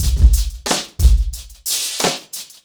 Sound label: 90BPM, acoustic drums; The Roots Drumloops